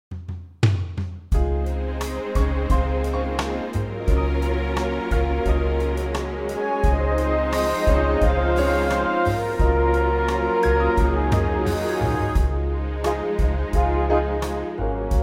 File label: Db orch; key - Db - vocal range - F to Eb